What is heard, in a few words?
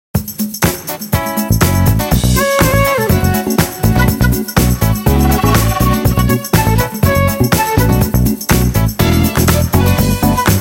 Rotation, Nokia Tones